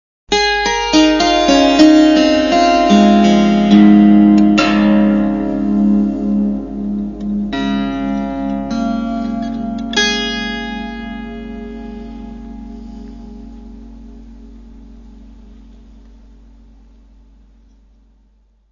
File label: harp